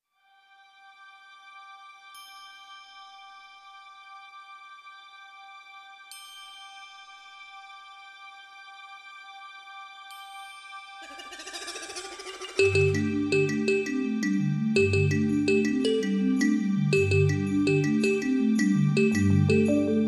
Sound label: world afro morning, kalimba